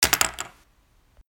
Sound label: drop pen, Foley; Crowdsourced Sample Pack; TRUTH-HZ